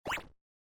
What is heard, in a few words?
Trigger; grammar games